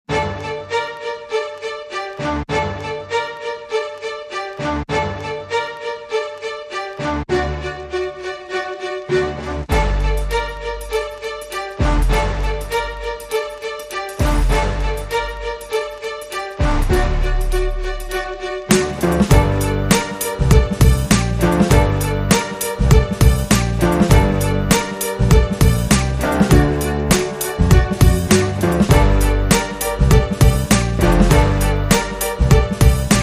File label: No Upper Strings